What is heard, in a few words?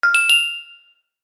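hover button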